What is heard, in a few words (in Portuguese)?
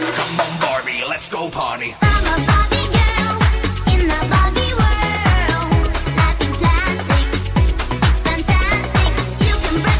musica; barbie